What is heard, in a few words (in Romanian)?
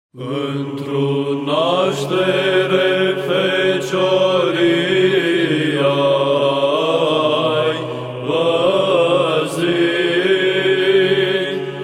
Troparul Adormirii Maicii Domnului; Cantari inchinate Maicii Domnului; Man Sinaia